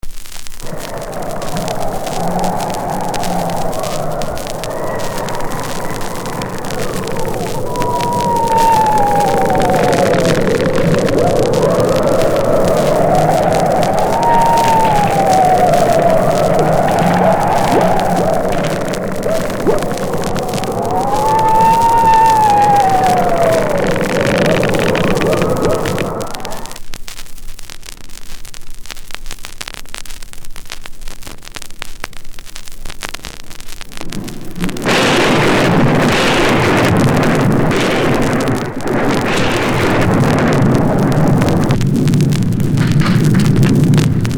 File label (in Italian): Effetti Sonori - Notte di tempesta; da collezione rara